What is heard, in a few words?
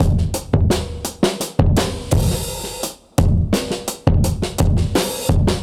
fill in, funky drum loops